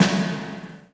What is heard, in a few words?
Snare FX layer